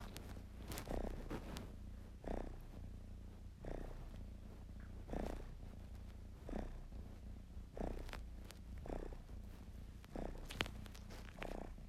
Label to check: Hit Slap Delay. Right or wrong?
Wrong